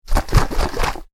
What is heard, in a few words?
food cream can aerosol shake, Aerosol Whipped Cream Can Shake; Shaking; Objects; McKinney Sound